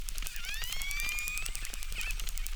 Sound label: Chirp, acquarium